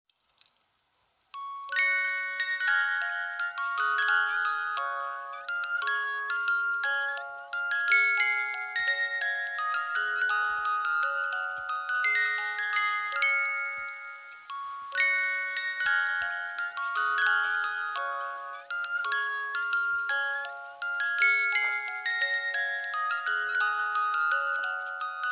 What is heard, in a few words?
Brahms lullaby